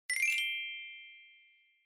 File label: Glocken High Long